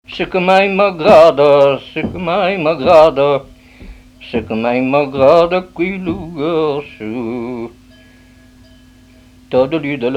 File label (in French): Bourrée; Bourrée de la marguerite d Espinchal